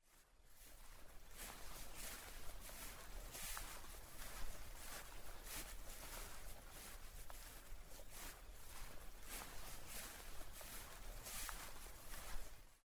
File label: Walk; Age of Empires III - Complete Collection; Microsoft Studios